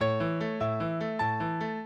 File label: minuet, mozart